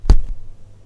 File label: Puncture